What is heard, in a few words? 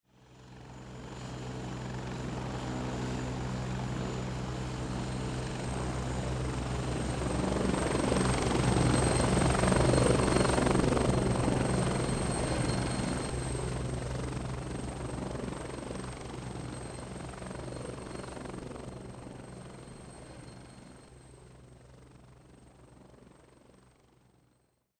Military helicopter flying overhead aircraft pass by